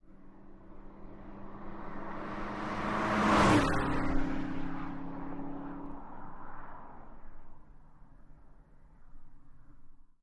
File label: Car Bys Fast Singles